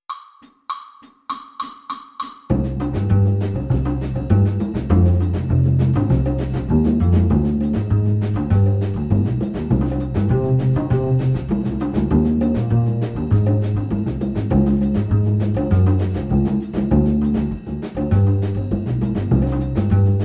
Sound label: Piano Samba Play a Long, Jazz; All-State